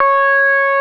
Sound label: SOLO SYNTH, JUPITER 8; Greytsounds VOL-1